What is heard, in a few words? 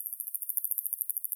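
Australia Western Australia flying red snapper; WA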